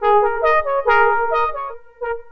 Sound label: Beat Blip Horn Loop; Sonic Foundry (Sony Creative Software); Ma Ja Le Ethereal Textures